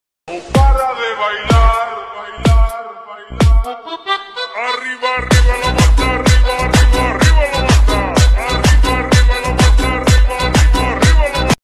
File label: remix lofi